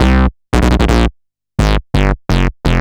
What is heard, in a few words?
Bass A dist 170 C, drum n bass 170bpm; musicradar; Basslines A